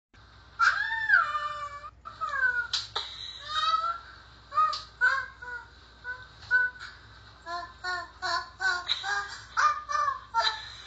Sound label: solo